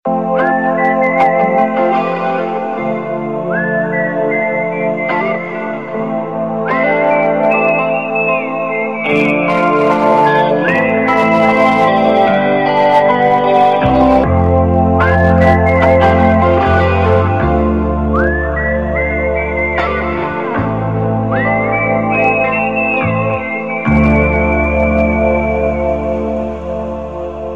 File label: New Whistle Ringtone